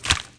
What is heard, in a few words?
Splat